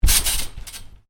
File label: Trampoline, bounce on: medium bounce on canvas trampoline; Miscellaneous Sport; Stuart Duffield